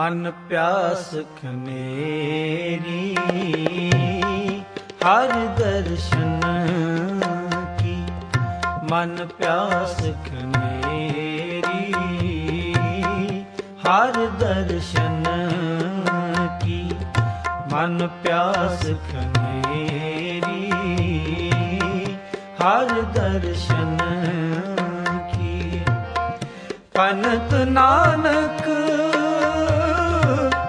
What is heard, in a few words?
Sikh Ringtone